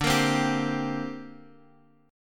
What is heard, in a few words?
D 7th Suspended 4th Sharp 5th D Guitar Half Step Down